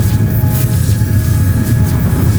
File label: desperate power loop; ryze